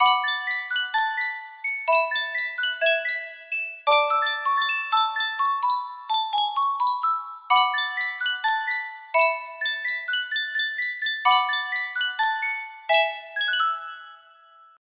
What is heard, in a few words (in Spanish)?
caja de musica